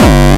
bombo, kicks, Hardcore

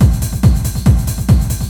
boomloop, audioloops

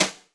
snaredrum, Yamaha RM 50

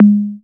Synth Tom H, Visco SpaceDrum; Drum Samples

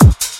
oonce

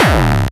kik

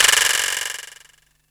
Quijada, Roland MT-32; Drum Samples

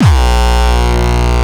kick; shock. Ultimate Kicks vol. 2

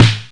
Snaredrum, Roland TR-626; Drum Samples

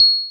Pling, Roland System-100; Drum Samples

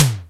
Tom, Roland D-70; Drum Samples

